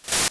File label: door Open